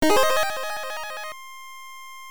Success Jingle; pop'n music GB Animation Melody

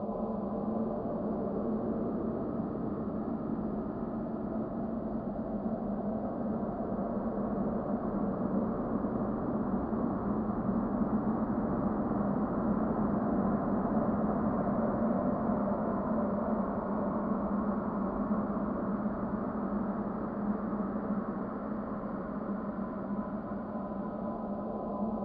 Ui Loop; Insanely Twisted Shadow Planet

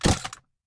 Drop Gun; lethal company; ambience; windows

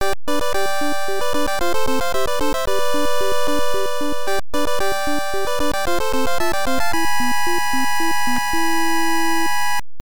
Congratulant Fanfare; the tower of druaga; nes

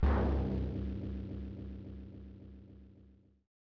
Checkpoint; Race Drivin'; SNES

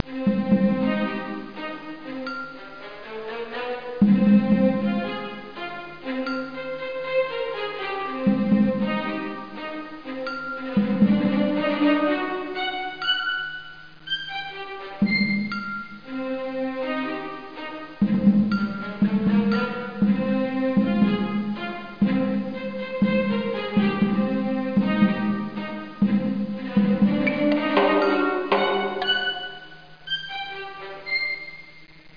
Orchestrated Tango; Spy Fox in Dry Cereal

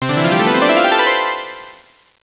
Jingle Result Good; Gotouchi Kentei DS

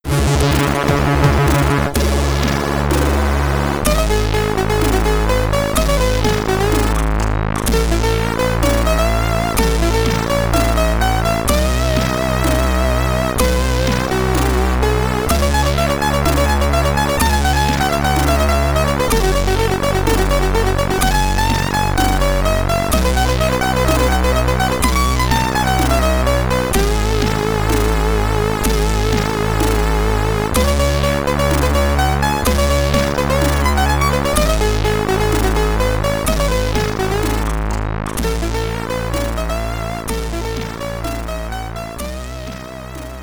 SID Stereo, commodore 64; Hawkeye; Cyberload Mix #08

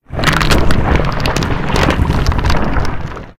Lava Oneshot Rocks; Angry Birds

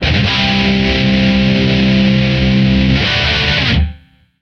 Guitar Power; Back to the Future: The Game; Android; PS3; Wii; Windows; Xbox 360

